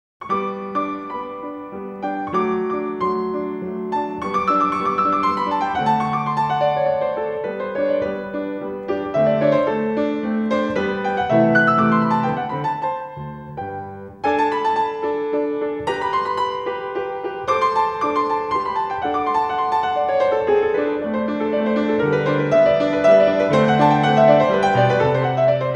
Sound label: Polonaise No 2 in G piano; Micha Kleofas Ogi ski